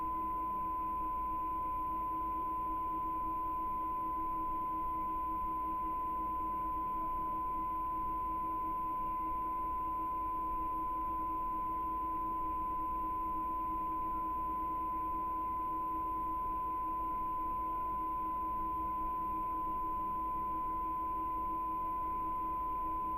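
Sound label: Calibration Channel 4 front Right; CESI; LaSpezia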